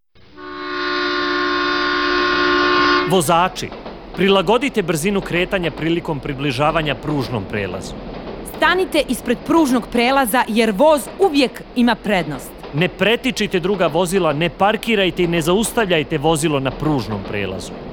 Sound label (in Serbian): Radio džingl